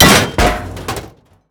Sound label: cigtray; Call of Duty Modern Warfare